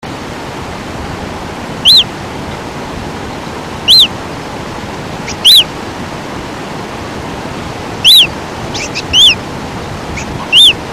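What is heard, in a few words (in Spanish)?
fiofio pollo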